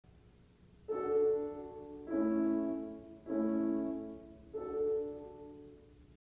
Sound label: opening harmonies; Inside Mozart k311 third movement